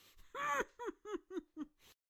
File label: Attack Vocals